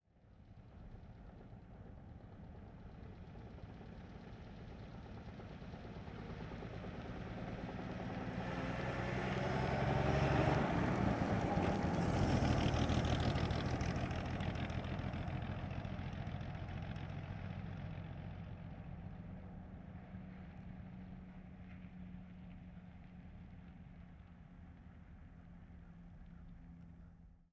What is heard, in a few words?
1937 Plymouth Ext Medium By & Shifts; Period Vehicles